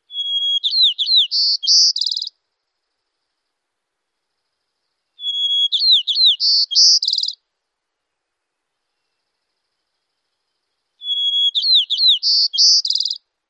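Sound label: white-crowned sparrow songs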